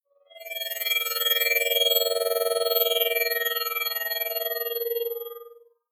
Future Telemetry Strainge Simmering Orb High Sweep Spaceship; Sound Design; Communication Sound Effects